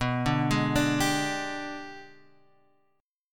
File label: Ab Minor Major 7th Flat 5th